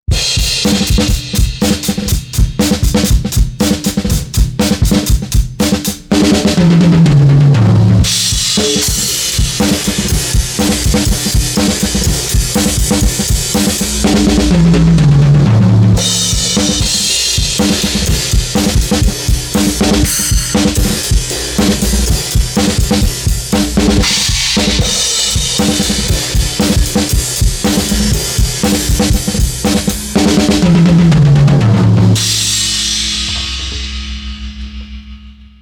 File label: drums Glue